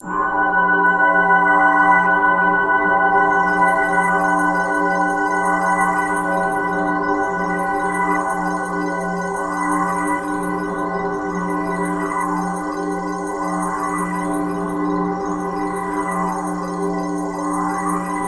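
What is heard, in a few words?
approach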